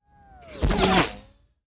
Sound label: Passing by very fast